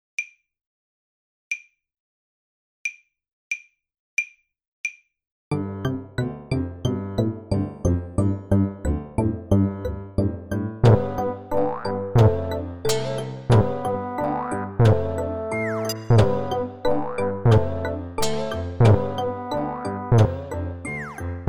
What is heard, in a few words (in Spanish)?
melodia para un robot